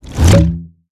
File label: Pilot fall